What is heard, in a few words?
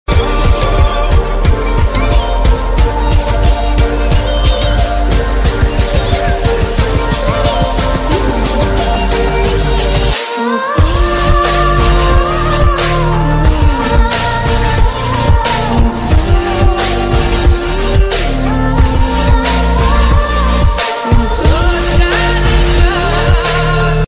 cool ringtone stylish english ringtone